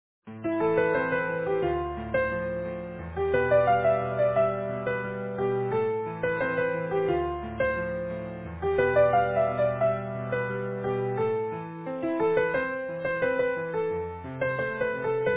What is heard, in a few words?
easy piano